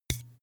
glass bottle handstroke hard

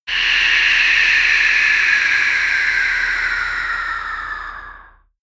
air breath scream

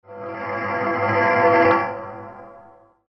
gong

